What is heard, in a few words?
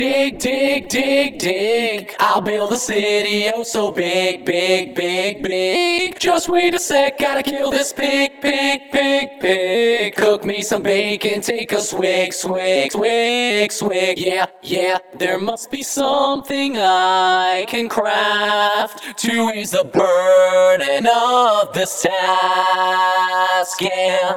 Vocals